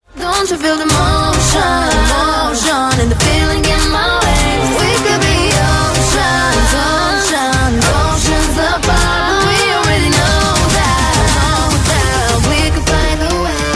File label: ringtone